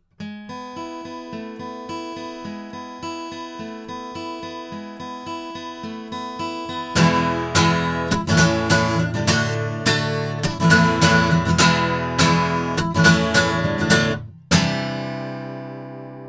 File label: ADK Vienna guitar, microphones